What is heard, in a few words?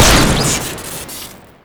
night revolver shoot crit; tf2classic